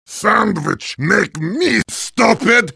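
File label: heavy pain critical death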